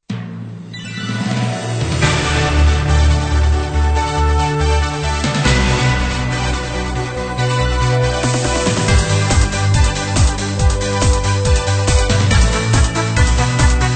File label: Japanese Opening; Hamtaro